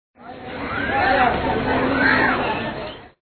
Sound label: Big Crowd; red cross eru